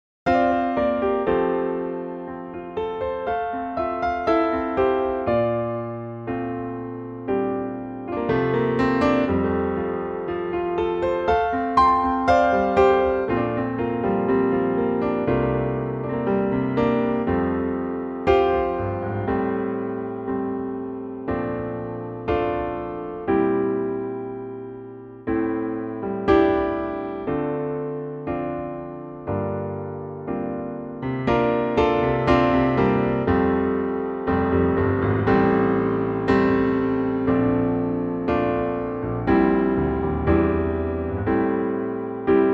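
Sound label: F Piano, male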